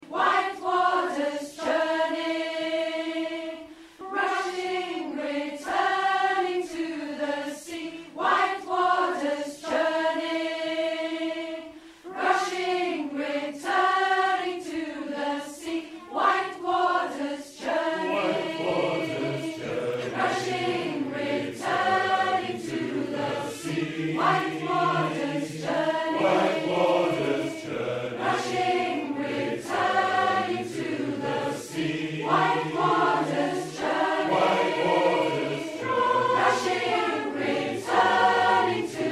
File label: white water (ensemble)